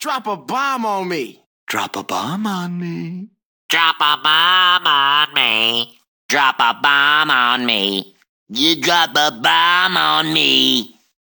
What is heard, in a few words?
Drop A Bomb